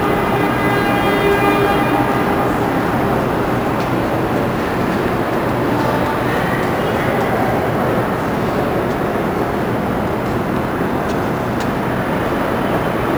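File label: NY 9-11 Memorial, exterior; ENV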